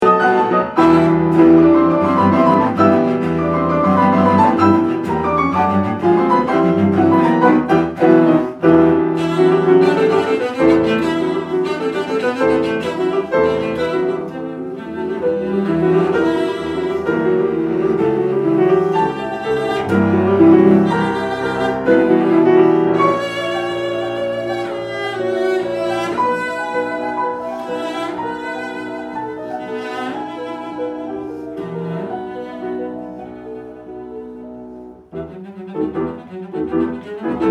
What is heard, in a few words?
Cello and Piano; Brahms